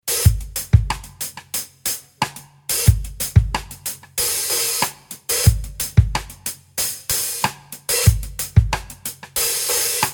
Bosphorus Black Pearl cymbals

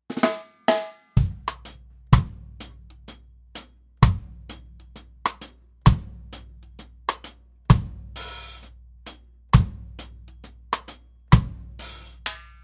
Reggae Kit

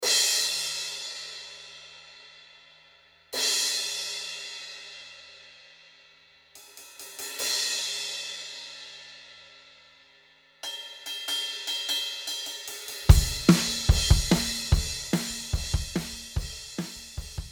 trx icon 16 inch thin crash

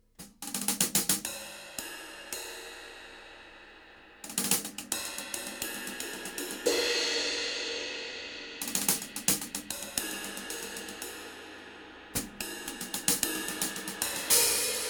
PCU Turkish Rhythm Soul cymbals

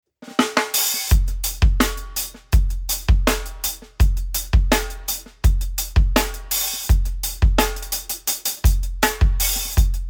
Zildian A series 13 in New Beat hihats

